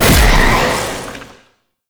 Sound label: Void Launch; Weapons; Warframe; Duviri; Incarnon Adapters